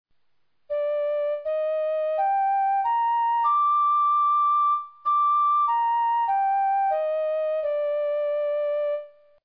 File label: lavangi, carnatic